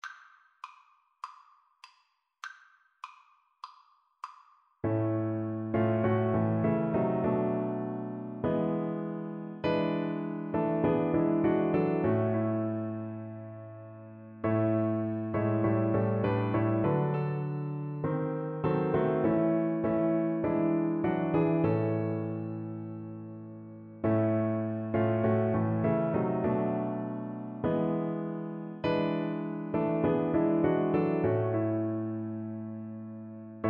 cello

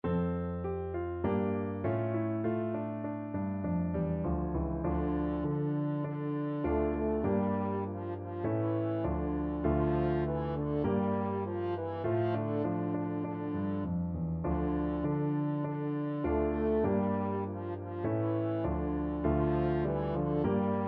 french horn

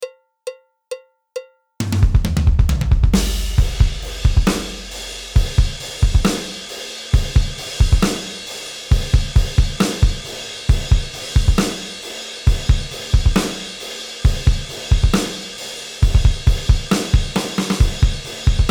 heavy metal beat, drums

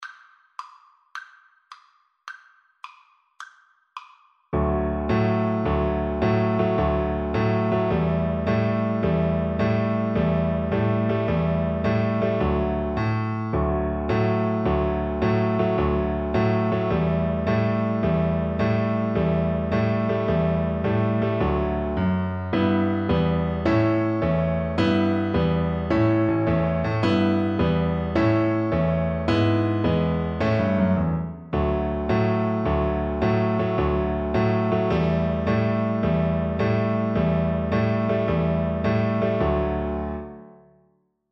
mexican hat dance viola

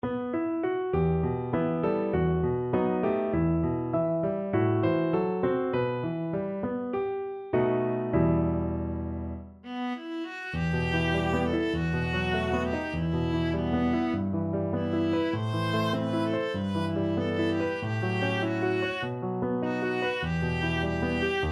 beach rainy day, viola

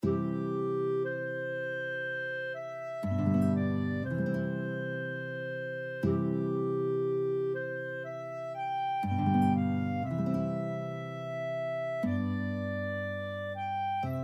clarinet guitar duet

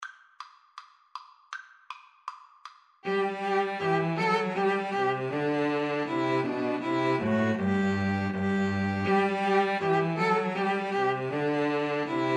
good king, string trio

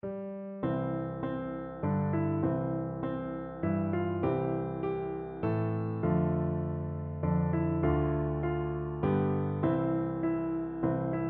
away in a manger, piano duet

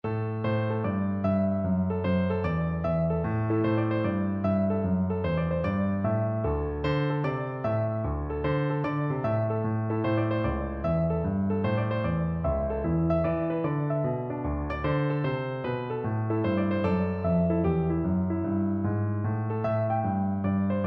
shuffle, piano

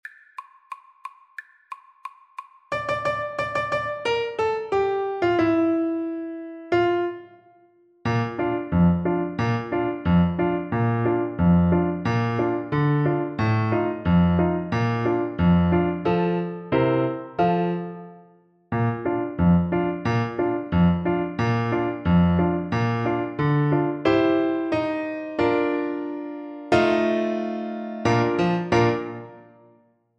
radetsky march, Bassoon

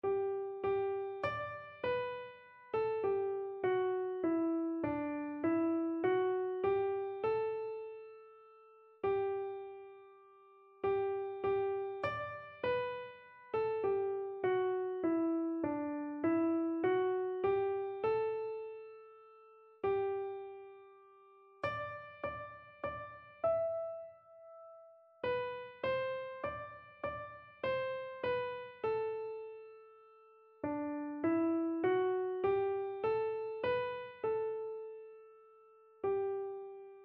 praise to the lord, keyboard

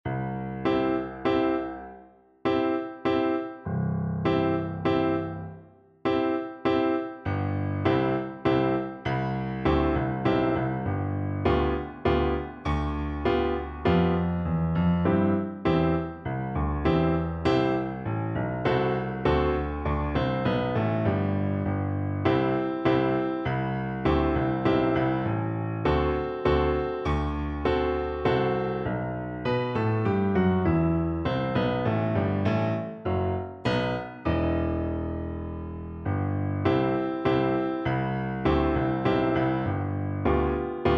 elephant BN, Bassoon

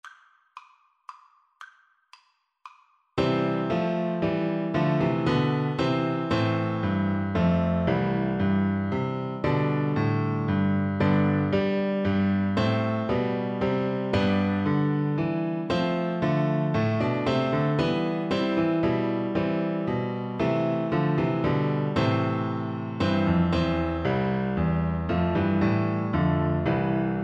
Oboe

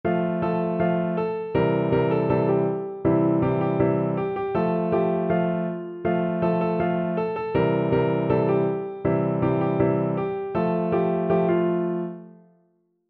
piano

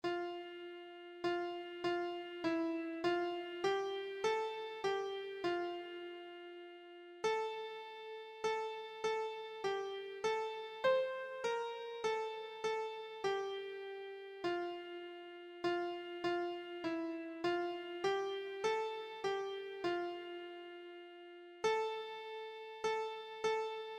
holy god keyboard

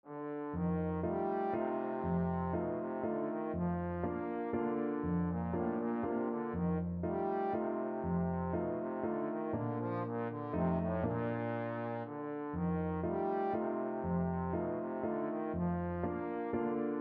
german dance trombone

